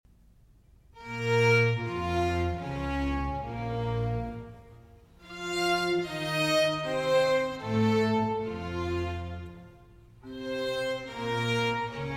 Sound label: Violin Concerto in B Minor